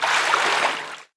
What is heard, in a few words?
wade, footsteps; Garry's Mod; tf2